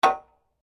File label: SLEDGEHAMMER METAL IMPACT HEAVY; Working with a sledgehammer; Hammers; Tools